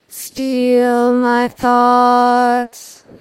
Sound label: singing